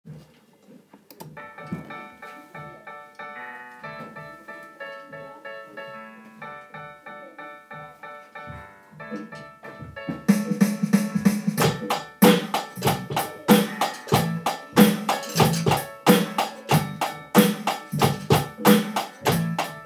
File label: drums